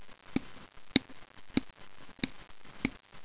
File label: slap